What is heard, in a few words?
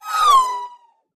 Tube Squeal Sweep